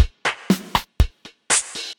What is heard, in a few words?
Kit DMX, Effected Drum Kits; Drum Machines; Ableton; Factory Packs